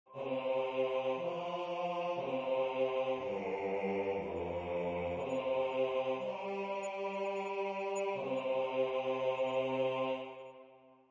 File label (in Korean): 주3화음 전체